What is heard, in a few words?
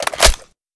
m14 mag in, weapons; hunger